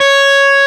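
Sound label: arpeggio; osu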